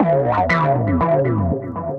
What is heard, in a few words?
Synth Rhythmic